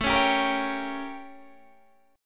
A 7th Flat 9th Guitar Open D

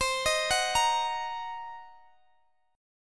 C Minor 7th Flat 5th Guitar DADGAD, chord

